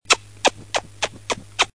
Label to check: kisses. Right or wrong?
Right